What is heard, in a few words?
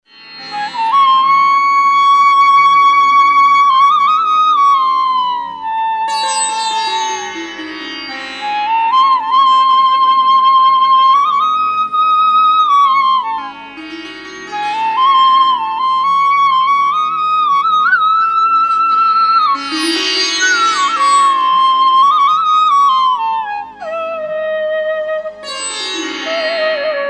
Flute; Ringtones by Lokanath Swami